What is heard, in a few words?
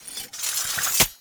Idle Sword Twirl; Arthur Hub; Nineteen Ninety Nine